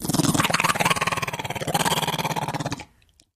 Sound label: Pit Bull Growling